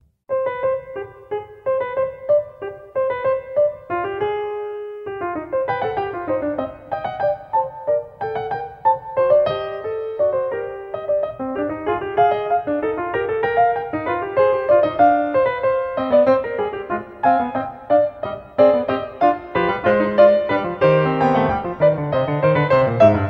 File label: well tempered clavier book 1 fugue no 2 in c minor; Johann Sebastian Bach